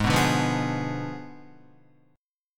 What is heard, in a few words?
Ab Diminished 7th Ab, Guitar, 4F, 4A, 5C, 5G, 5C, 6E; Abdim7 strummed; JGuitar